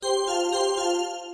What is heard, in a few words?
Alert Bell